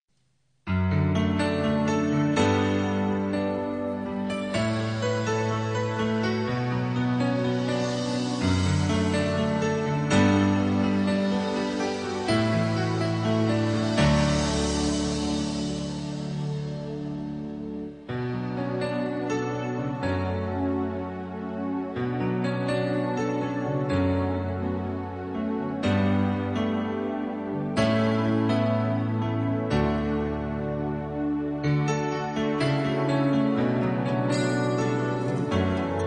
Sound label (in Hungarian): INSTRUMENTAL